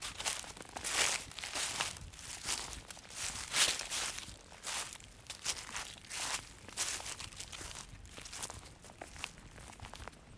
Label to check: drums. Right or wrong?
Wrong